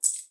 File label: Coins